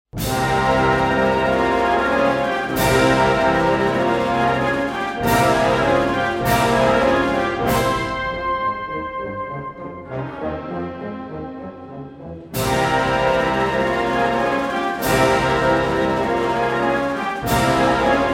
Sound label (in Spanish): Banda de música